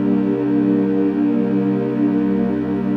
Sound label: PAD, Dance Mega Synth